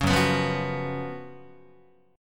F sharp Major 7th Suspended 4th, Guitar; F#M7sus4 strummed; 4,2,2,3,3,5

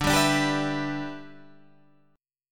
G 6th D

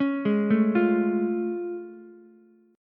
A Augmented Major 7th A, Ukulele; arpeggiated

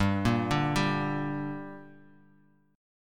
G Minor G; arpeggiated; 15,13,12,12; JGuitar

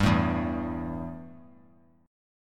E Minor Double Flat 5th E; strummed; JGuitar

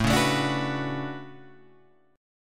D Major 13th A, chord

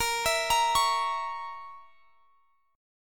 A sharp Diminished, chord; arpeggiated; chordshape

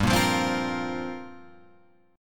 G Minor 11th, chord, Guitar Open F; Gm11 strummed; JGuitar

